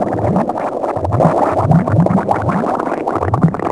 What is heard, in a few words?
Whale Gurgle; Finding Nemo; XBOX